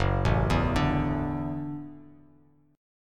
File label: F sharp Minor 7th Sharp 5th F sharp Bass Standard; arpeggiated; JGuitar